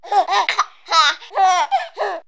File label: Comedy Baby Laugh; vox mix; X-Static Goldmine